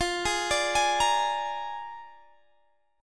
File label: A sharp 7th F, Guitar